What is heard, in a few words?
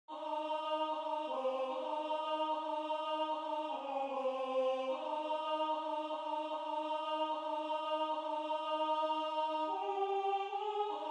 Alto, choir; O For A Thousand Tongues